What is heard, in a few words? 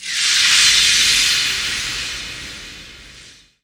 scabbards